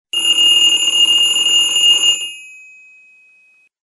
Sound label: Bell